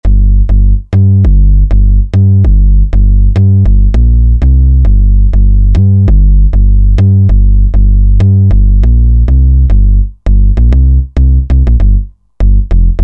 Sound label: House Bass, Roland JD-990; Factory Patches